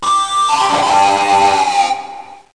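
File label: electric guitar; instrumental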